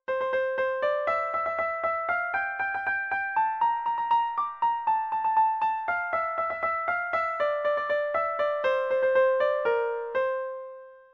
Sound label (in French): piano echantillon; Est ainsi l etincelle